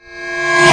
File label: gongi; found sound; Clangy Crunchy Foundsound Kit